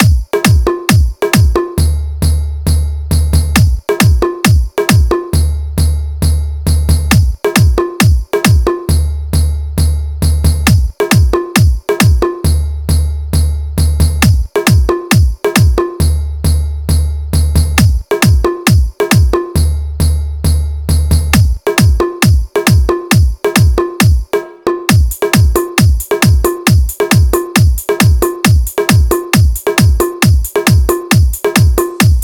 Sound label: Competition Beat Remix Song